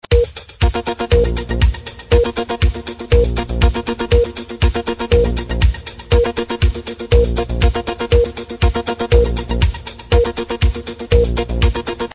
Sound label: news headlines ringtone